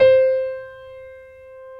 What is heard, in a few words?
C4 PNO.FOR, G.PIANO; AKAI S-Series CD-ROM Sound Library VOL-7